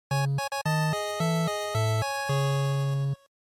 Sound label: NES Game Over